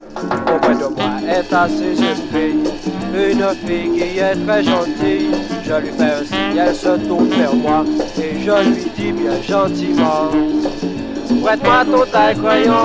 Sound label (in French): musique calypso; Conférence audio Laméca